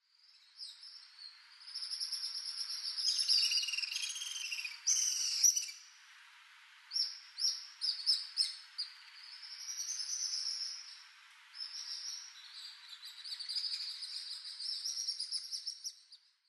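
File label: House Swift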